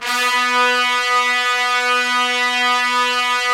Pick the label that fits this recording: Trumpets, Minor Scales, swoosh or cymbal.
Trumpets